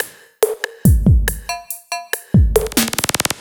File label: Drums; Sonic Foundry; Machine Language